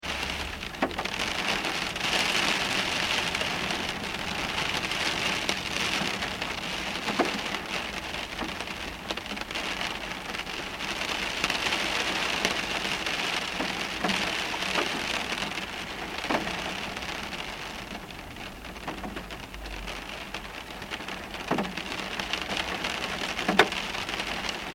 weather rain med car roof window internal, Rain On Parked Car (Internal Recording); Rain ( Medium ) Falling On Parked Car Roof And Windscreen With Dripping From Trees; McKinney Sound